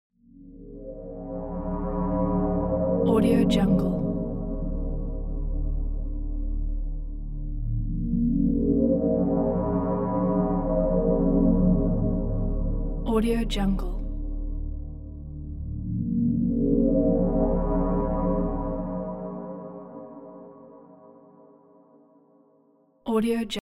sci-fi space bio core ship

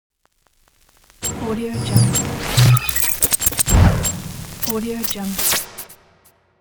intro logo digital glitch

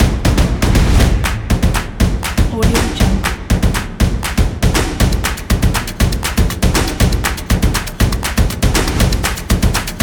stomps and claps action logo

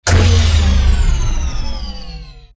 power down

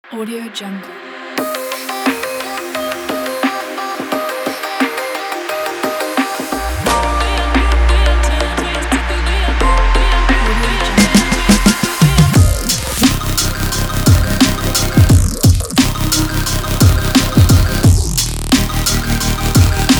inspirational hiphop

